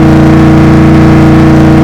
challenger on low

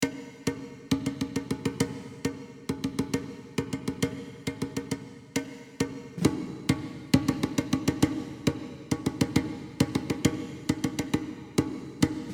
Battle Intensity

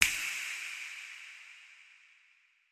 Click fingers; miside demo

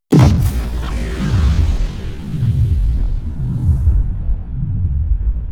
portal spawn; lego ninjago rise of the snakes ios gamerip

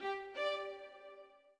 orbit violin; Sky Children of the Light gamerip

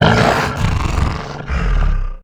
Dragon Disgust

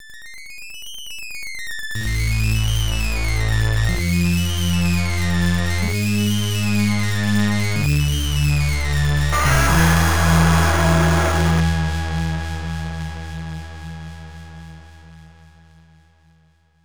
Title, With Voice; Prehistoric Isle

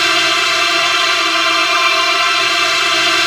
Music Stinger Stereo Loop; MushroomBoss

